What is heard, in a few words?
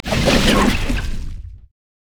sonic wave; LoL; leesin skin05